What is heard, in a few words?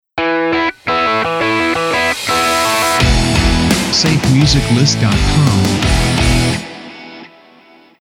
Bang Stinger; SafeMusicList